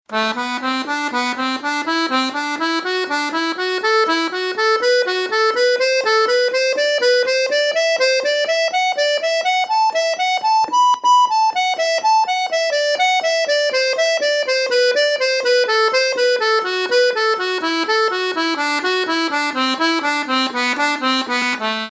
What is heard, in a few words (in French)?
gamme synthetique sol par 4 normal; Gamme synthétique de Sol normal par 4 en tiré par 4